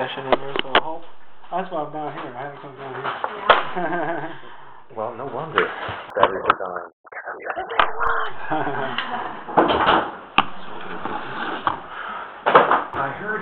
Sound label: batteries dying